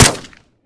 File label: fire; tacz; daffas arsenal